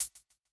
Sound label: hat